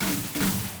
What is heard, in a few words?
robo double; Apotheorasis